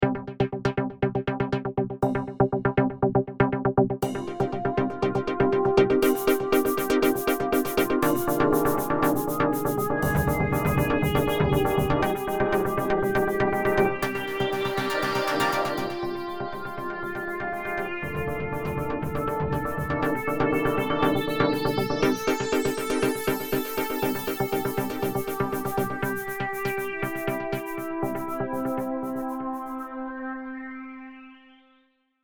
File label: beat